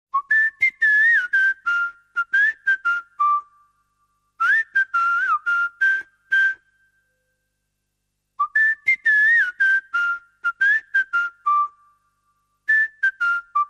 Whistle Only

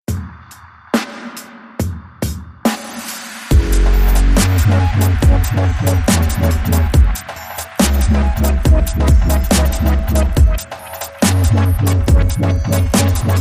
Wobble Bass, Short

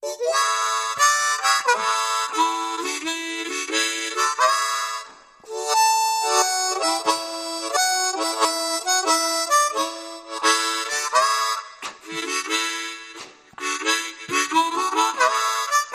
Mouth Organ

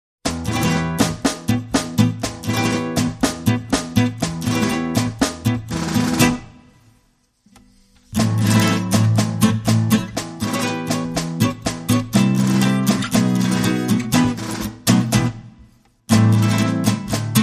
Guitars and Percussion

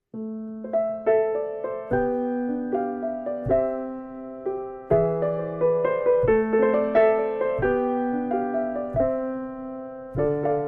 Piano

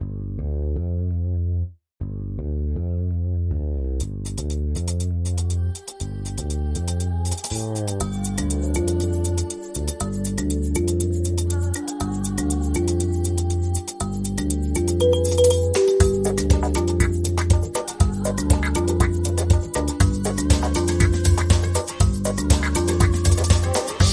Bollywood Groove

